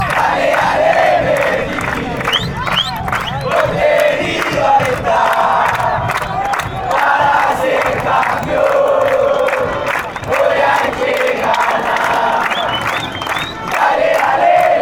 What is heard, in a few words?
dale dale medellin, chants